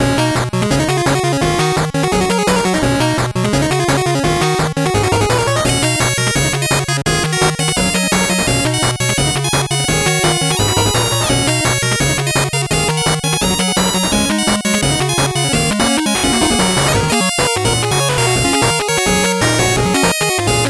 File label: 8bit bgm fast